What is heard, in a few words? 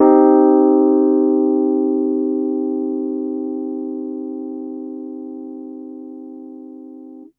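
Piano Rhodes minor med; TrashLibrary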